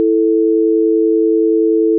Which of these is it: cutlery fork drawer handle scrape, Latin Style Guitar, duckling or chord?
chord